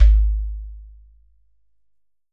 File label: GHATAM